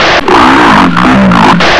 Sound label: zombie idle; svencoop